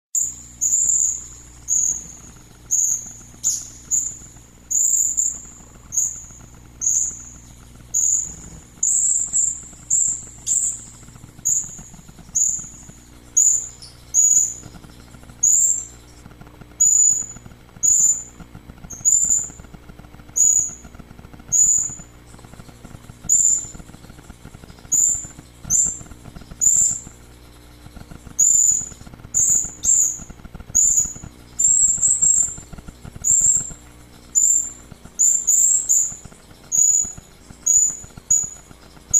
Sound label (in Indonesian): serindit